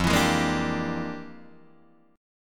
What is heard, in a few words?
F Minor Major 13th, Guitar, DADGAD